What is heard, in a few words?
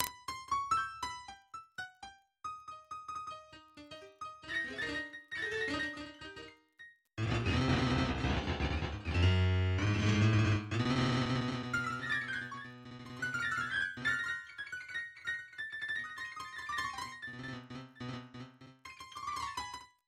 Background Music